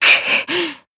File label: damaged, jp